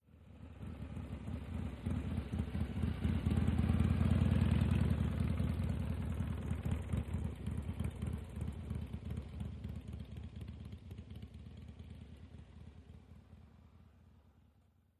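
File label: Motorcycle Harley By Very; harley-davidson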